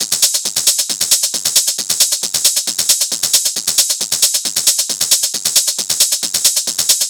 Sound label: Hat Loop 135, Techno; Loops; Drums; HiHats; Ghosthack; AC2024 - Day 1 - Techno Sounds 2025